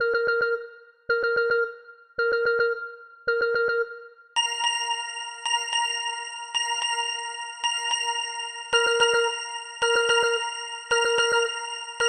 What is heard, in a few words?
clean critical alert